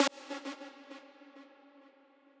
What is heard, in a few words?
lead